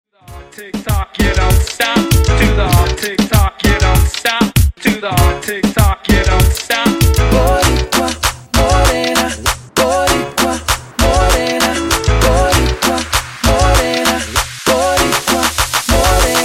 Hype Reggaeton 98Bpm

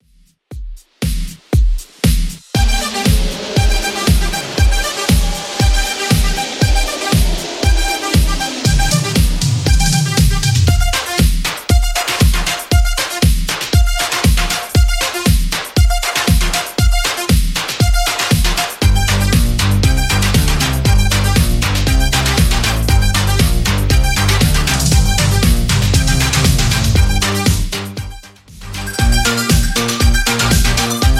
80s Redrum 118bpm

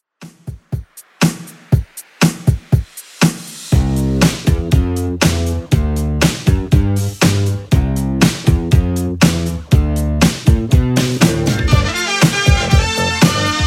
80s Redrum 120bpm

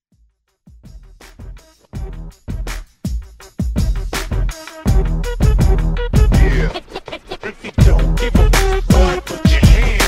throwback redrum

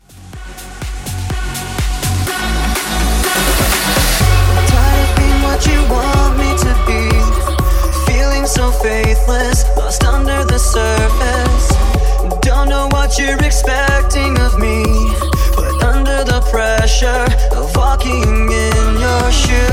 Hybrid Bootleg 124

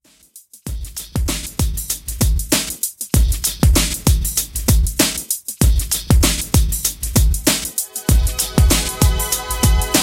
80s Extended Mix 97Bpm

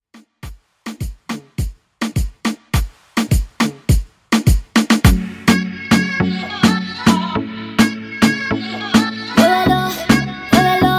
Pop Moombah Blend; Remixfreakz

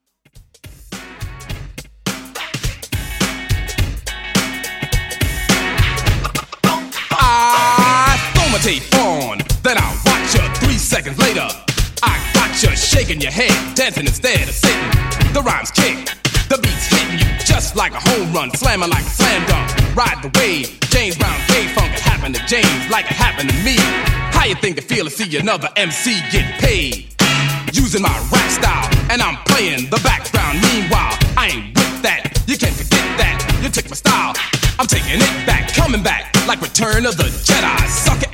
80s HipHop Redrum 105Bpm

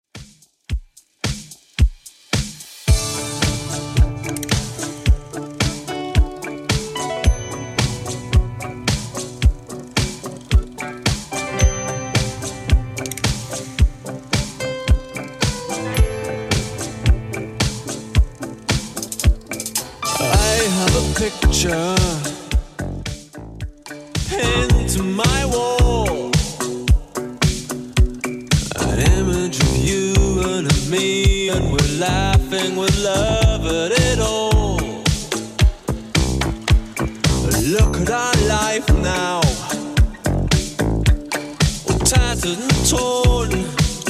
80s Redrum 110bpm

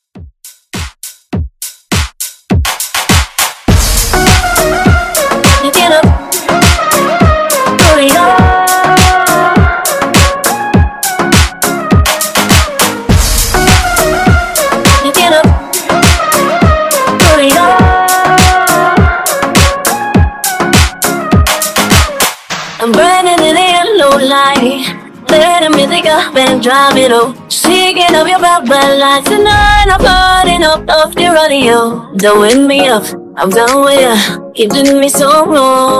Moombahton Redrum

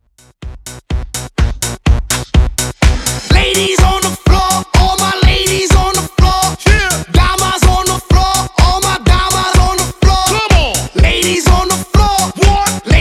Extended Redrum intro Hype 125Bpm

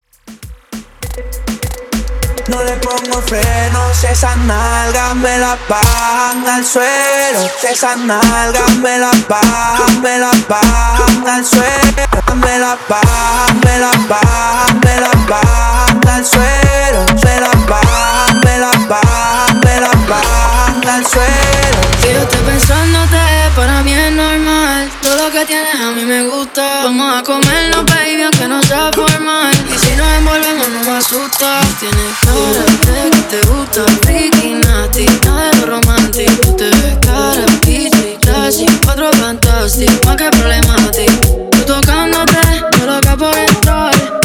Club Hype Reggaeton 100 BPM

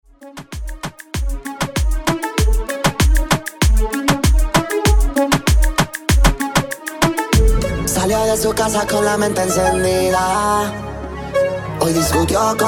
Latin Reggaeton Redrum

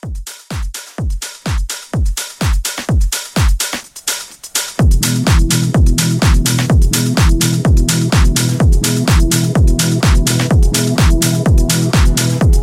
80s House 126; Re-Drum

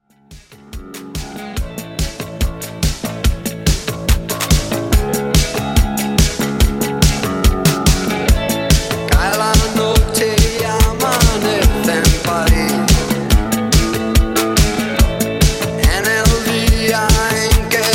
80s Redrum 143 Bpm

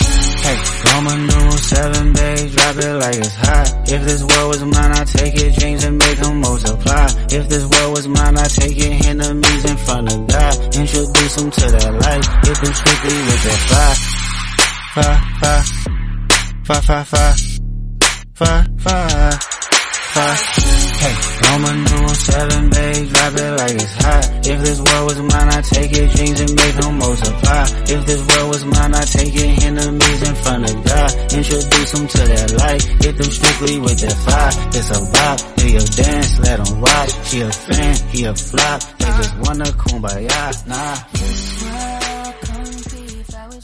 140bpm D

